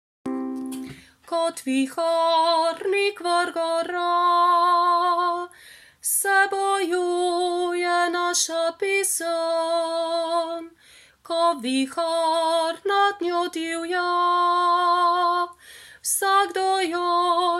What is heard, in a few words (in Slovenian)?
Viharnik 3. glas 1. kitica